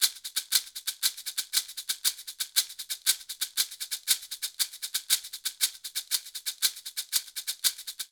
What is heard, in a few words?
Descarga A Maracas 118bpm, Latin Percussion; Ableton; Factory Packs